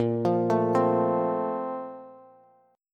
Bb Major 7th Suspended 2nd, Bass; BbM7sus2 arpeggiated; JGuitar

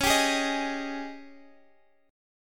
Ab Major 7th Suspended 4th Db

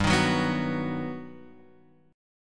G Augmented Major 7th

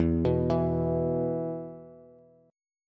E 5th, Bass; arpeggiated; JGuitar

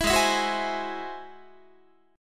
G sharp Augmented 7th G sharp Guitar; G#+7 strummed; JGuitar

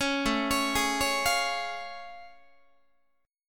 A Augmented Major 7th A, Guitar; arpeggiated; JGuitar; 16,17,16,18,17,16

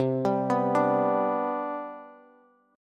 C Major 7th Suspended 2nd C down Bass, chord; CM7sus2 arpeggiated; JGuitar

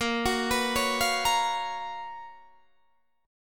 Gb Major 11th

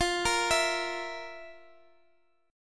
F Major 7th Suspended 4th, Guitar, Open G; x,22,20,21,x,x; down; chord shape

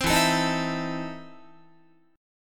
E Major 7th Suspended 4th Sharp 5th, Guitar

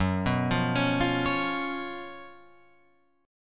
Gb Major 7th Suspended 4th Gb Guitar Open F

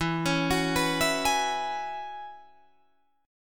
E 6th Add 9th E down Guitar Drop D

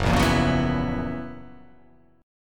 Gb 11th; Gb11 strummed; JGuitar

